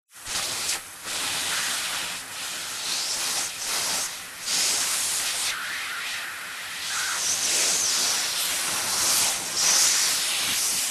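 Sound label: household vacuum cleaner hose suction carpet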